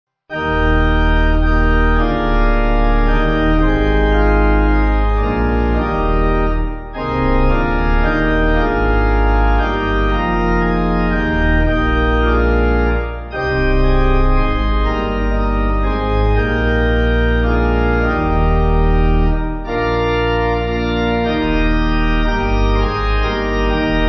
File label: Evening Hymn Pipe